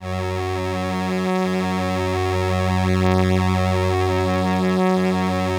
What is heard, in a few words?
LAMBDA, String Machines